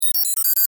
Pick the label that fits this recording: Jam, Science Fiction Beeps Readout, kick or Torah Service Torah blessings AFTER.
Science Fiction Beeps Readout